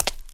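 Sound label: single knock of small heel; odinochnij stuk nebolshogo kabluka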